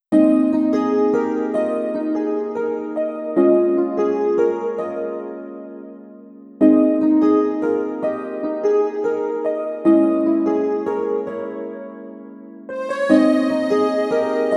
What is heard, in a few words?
Peaceful Village